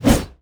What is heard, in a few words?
BOSS Atk